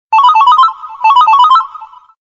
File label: Reverb Clap